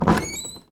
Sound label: door open; Golden Crayon Sounds